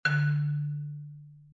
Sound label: tone